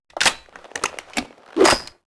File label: reload sawed shotgun; weapons; Max Payne